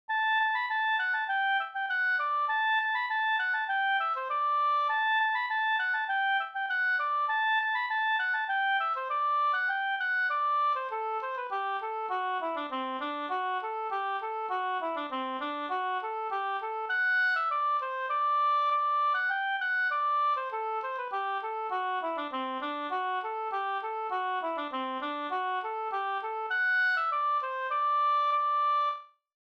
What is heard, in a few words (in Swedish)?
halling; e Pehr Myhr Hj EO708